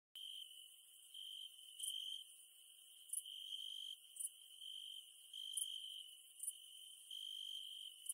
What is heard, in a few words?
Forest and crickets at night; آرامبخش; جنگل و جیرجیرک